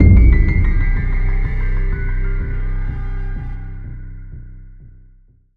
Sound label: Mystery Ident; soundscrate